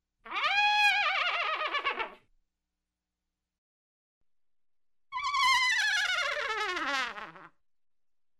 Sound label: simulated ryeing on a pipe, zvuki rzhaniya loshadi i konya